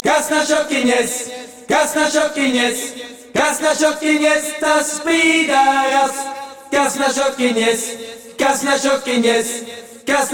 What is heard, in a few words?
accapella